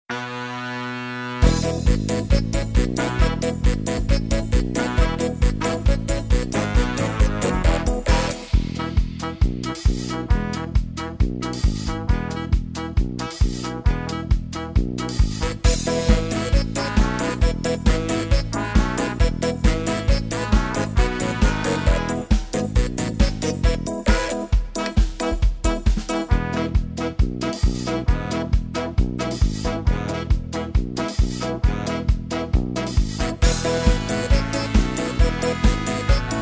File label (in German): Geburtstagslied Playback; Grundschulblog; Franzoesisch